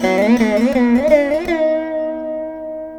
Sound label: VEENA; Spectrasonics; Heart of Asia